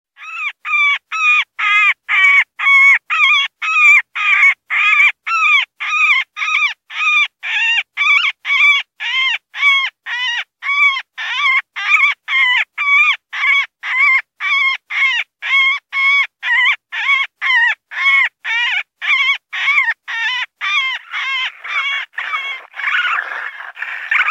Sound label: Raccoon & Bird Fighting Distress